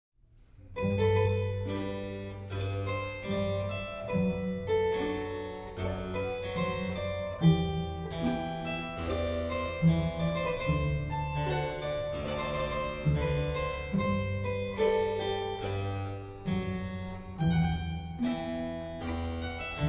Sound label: Harpsichord Concerto No. 5 in F Minor